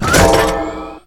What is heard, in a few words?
Pickup Scifi; SFX Kit